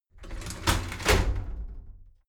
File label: shower slide door inside open heavy